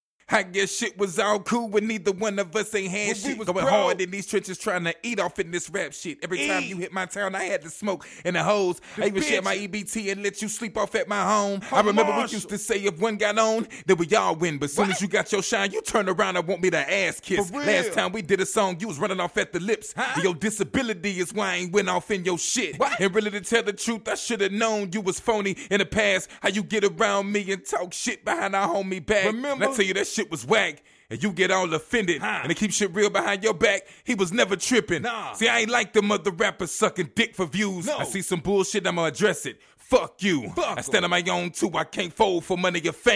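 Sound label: hip hop 172 A flat minor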